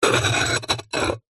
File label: household pot ceramic base lid plate scrape